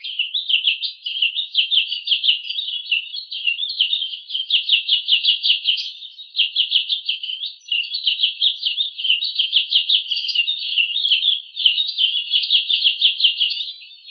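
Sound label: birds high pitched peeping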